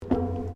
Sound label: Footsteps Boots Metal Spiral Stairs Steps; Footstep Foley; Footsteps (Sound Effects:Human)